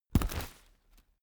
umbrella telescopic close fast

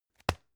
tissue package drop

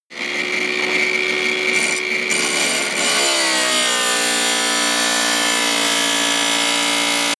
tile cutter on load

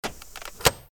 door lock

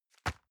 envelope drop medium

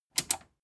metal button tiny click enable fast

